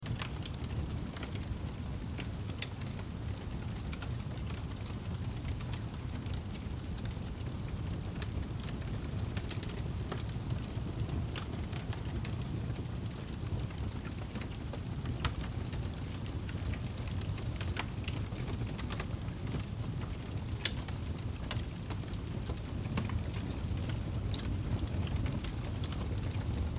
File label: Fire Fireplace, Fire Fireplace Light Crackle Large Loop; Flames; Flame Sound Effects